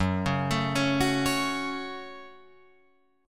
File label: Gb Minor Gb Guitar Open F; arpeggiated; JGuitar